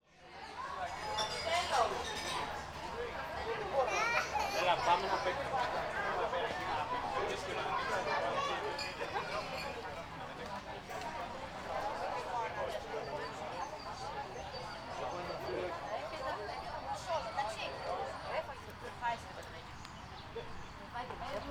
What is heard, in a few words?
Ambience Bar No Music